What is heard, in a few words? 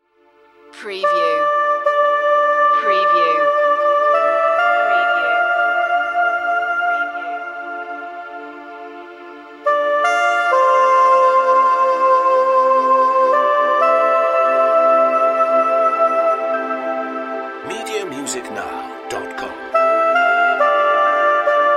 soprano sax air